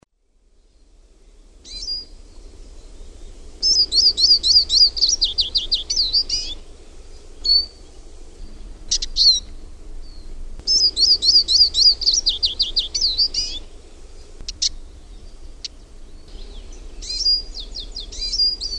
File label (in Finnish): VIHERVARPUNEN, LINTUAANITYKSET